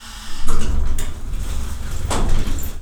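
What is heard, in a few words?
elevator DOOR; InVision Interactive; The Piano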